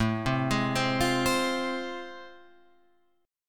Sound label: A Minor Major 7th Sharp 5th, Guitar Open F; arpeggiated; AmM7#5; 9,7,8,7,8,7; JGuitar